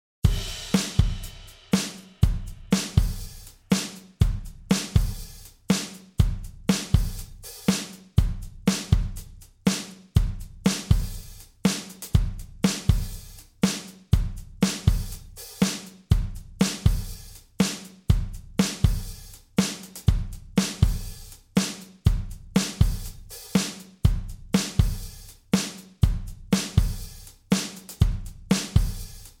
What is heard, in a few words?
Drums